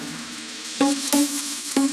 pop sound; sound effects

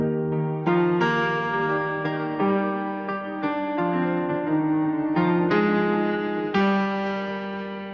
sad piano

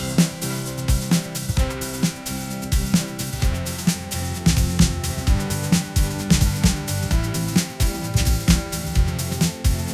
corporate sting; sound effects

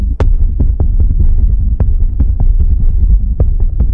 robot beep; sound effects

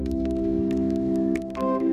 camera shutter

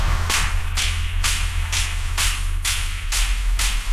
bass drop

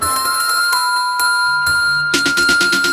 banana slip

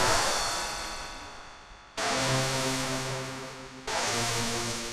victory horn; sound effects

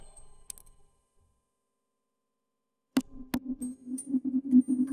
alarm bell; sound effects

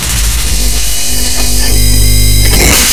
hype whoosh; sound effects

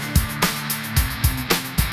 pop sound

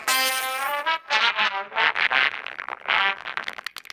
sad trombone; sound effects

